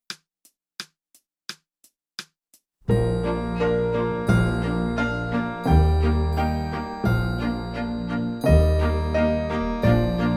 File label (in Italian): aria francese, trombone solo; facilissimo; tromba